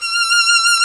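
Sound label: STRINGS SOLO, Chamber Grp; Roland L-CD702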